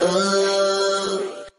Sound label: "oh" SUNG